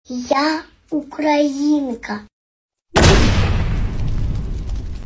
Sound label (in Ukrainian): vzryv; ia ukrainka